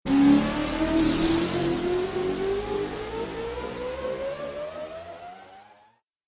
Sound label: Robot Fly Away, sci-fi robot sound effect; Robot Sound Effects; Andrew Fleming